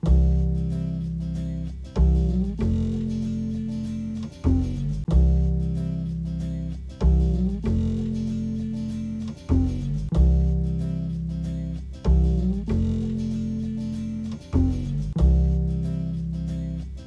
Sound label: loop